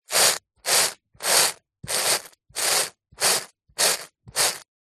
household hairbrush bristles plastic scrape carpet; zapsplat